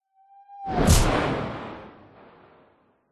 science fiction projectile incoming weird hit; zapsplat

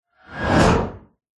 science fiction space vehicle whoosh past

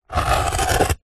office pen biro draw single line on thin wood

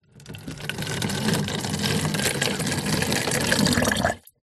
milk pour into empty plastic bottle; food drink; zapsplat

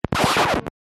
vinyl needle scratch; the sound pack tree

